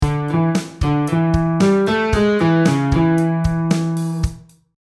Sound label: Octave below